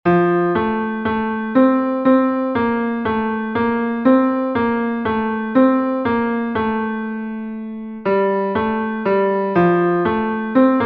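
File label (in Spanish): TENOR, himnos en piano; LOORES DAD A CRISTO EL REY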